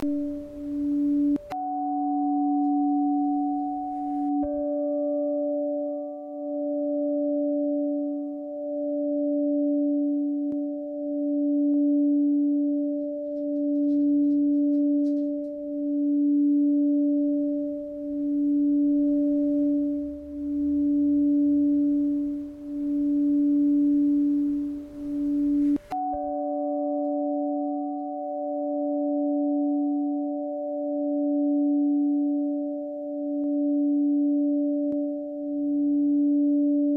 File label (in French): bol cristal note ré la